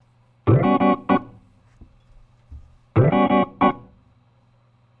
Organ, 70s Analog Funk

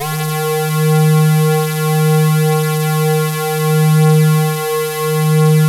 SAW, Synth and Organ; InVision Interactive; Keith Emerson; The Most Dangerous Synth and Organ

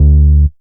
Bass one shot D, Basses; Electronic Point Blank

